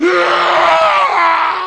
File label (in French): rage, FIGHTING; East West; Global Sounds